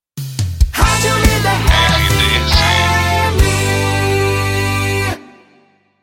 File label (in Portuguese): PONTE RADIO; Rádio Líder